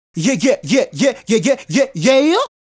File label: Male Solo, Vocal